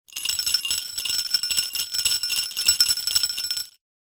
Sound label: jingle bell